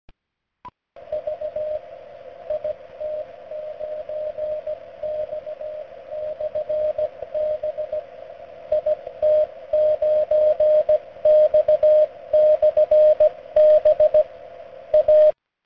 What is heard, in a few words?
beacon; 6m; it9x; rxd by zs6nk